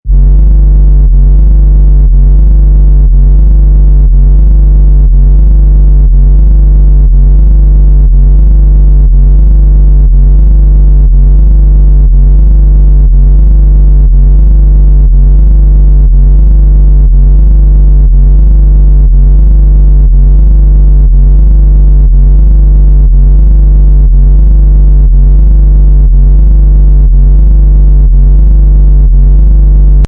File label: Deep Dist Eng, Deep and creepy evil bass sound; Deep Distorted Engine; LOW FREQUENCIES: USE HEADPHONES OR SUBWOOFER TO DEMO